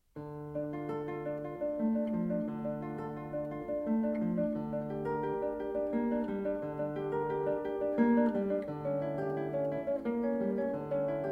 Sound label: Prelude in C Minor, For Guitar; BWV 999